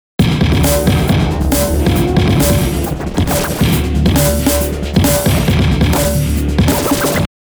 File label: vinyl; sugar bytes; effectrix